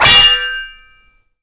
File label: MagicItem GhostSwords Impact